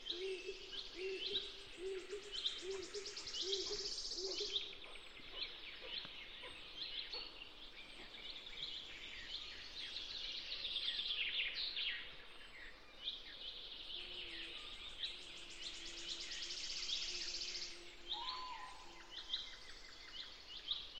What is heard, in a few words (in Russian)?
negromkoe sladostnoe rajskoe penie ptic